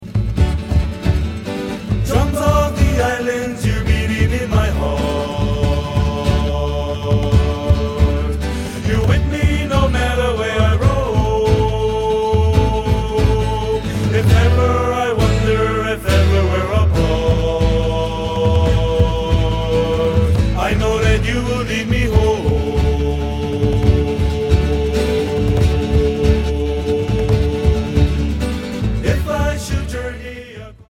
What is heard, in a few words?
makaha drums